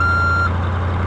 FORKLIFT; REDNECK; RAMPAGER

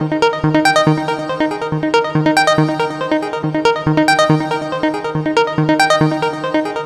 Techno, Melody; Techno Music Maker

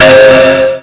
Orchestr; RivalBall for Macintosh

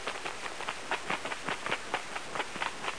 wing

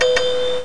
GOOD bell

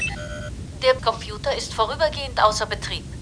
reset sound

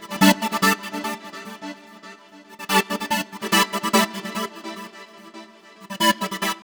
PAD; GrooveMaker

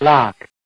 LOCK; Falcon4

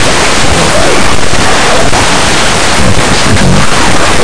club

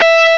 Dist Guit (E2); X-Wing; X-Wing Patches

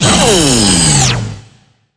energy

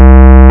Wrong; Alley 19 v1.11

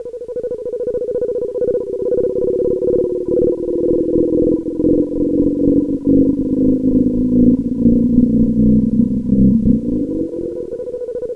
Nav Buoy loop

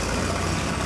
Rattle; SKRAP

